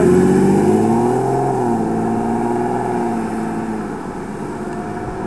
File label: tiptronic car engine